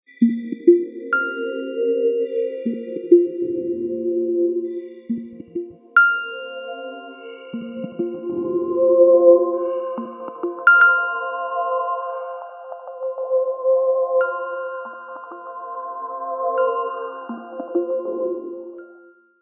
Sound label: Clock, Nokia Tones; Odyssey; Windows Phone apps